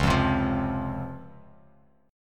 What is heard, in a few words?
Db Major 7th Db down; strummed; JGuitar